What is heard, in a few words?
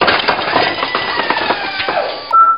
reload; svencoop; frostline